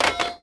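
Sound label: pipe drop creaky wood; objects; Star Wars Obi-Wan; XBOX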